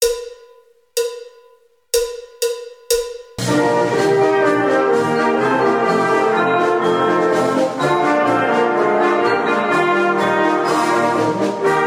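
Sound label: March with Pickup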